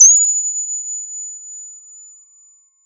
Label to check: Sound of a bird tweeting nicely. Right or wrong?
Right